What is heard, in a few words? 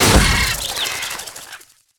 Bird Cage Breaks; angry birds rio